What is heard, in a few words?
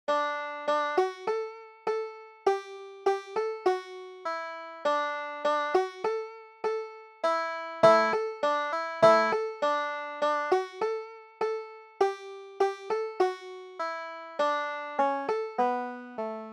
banjo